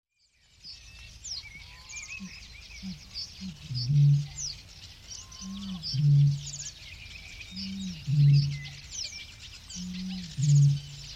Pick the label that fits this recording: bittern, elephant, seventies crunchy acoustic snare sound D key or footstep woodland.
bittern